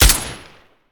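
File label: Weapon rifle assault silenced fire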